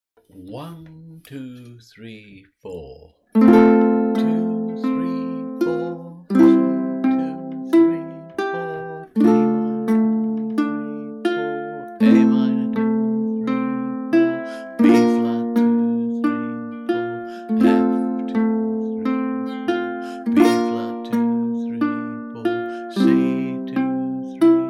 Pachelbel, Low G Arpeggio

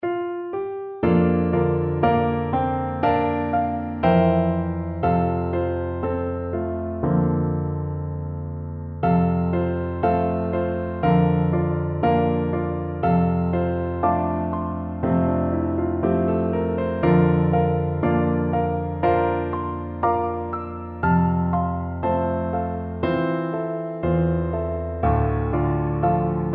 accomp, F; Oh Shenandoah

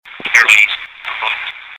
ghost box

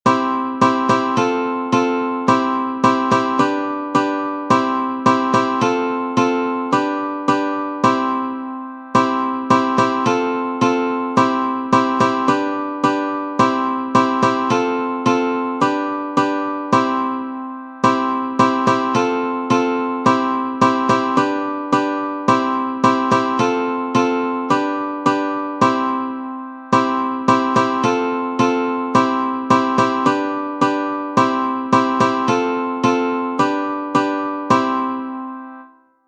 Normal Strum; Shepherd s Hey

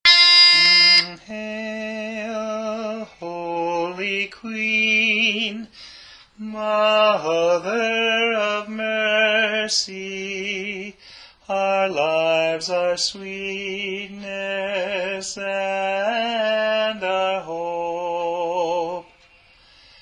Lead; Hail, Holy Queen

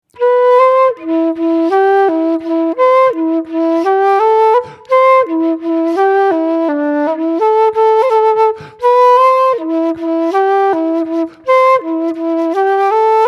Slowest; Geese In The Bog

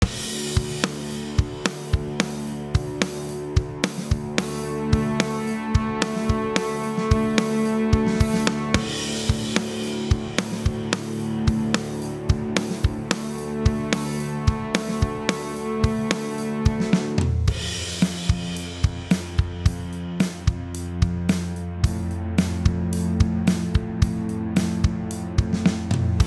Rock Cello

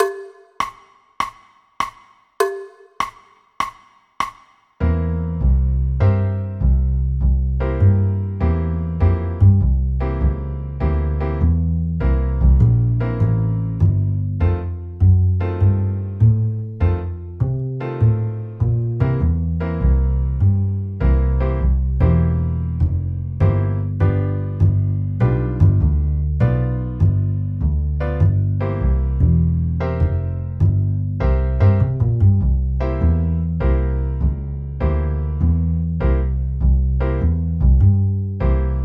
Swing Drum Set

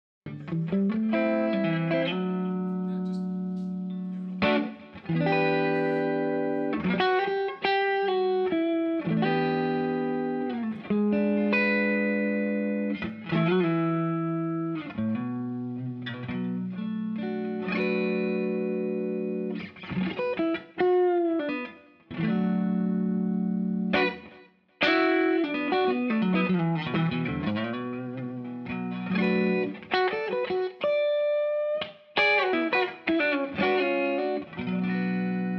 Bluesy Clean; Lil Monster 3

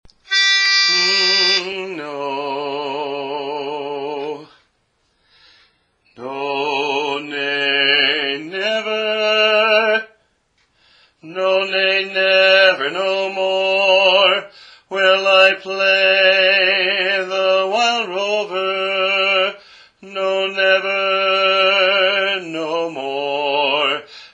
Bass; Wild Rover

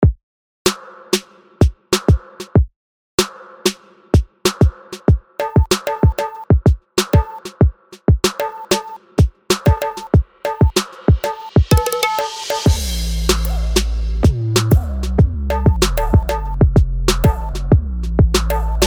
Karaoke

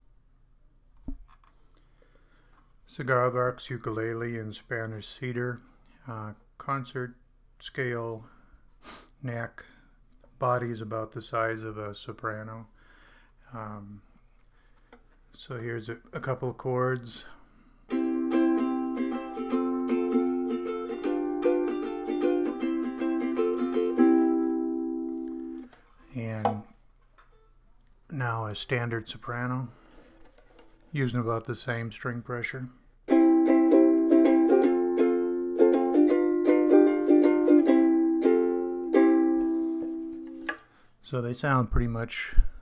cigar box

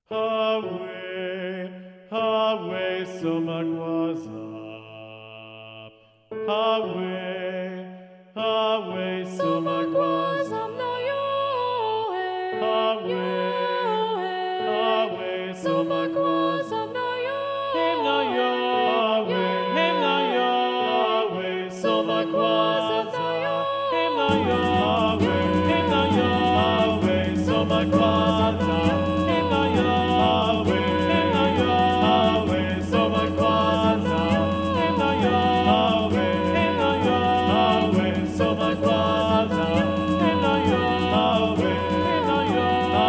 Soprano Muted; SATB; Somagwaza

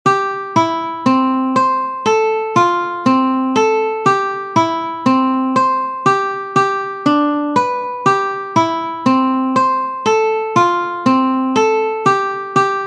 Slow Picking Pattern; Shepherd s Hey

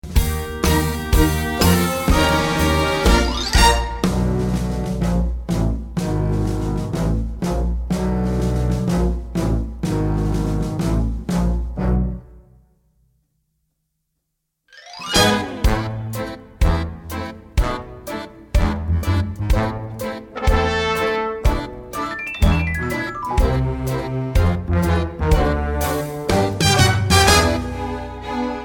Instrumental

